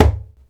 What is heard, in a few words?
DJEMBE, ETHNIC PERC; Instruments & Percussions; InVision Interactive; Lightware VOL-1